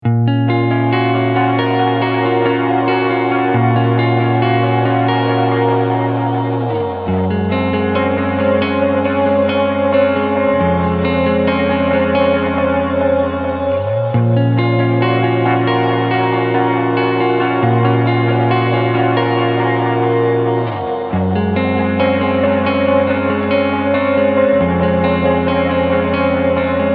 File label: lofi; corrupted flowers